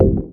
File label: Dance Mega Synth; Best Service; 90 s Sample CDs